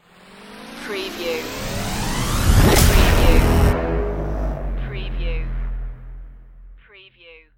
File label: trailer madness stinger